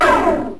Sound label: CM SHOT; PoolDisk Too; JAGUDOME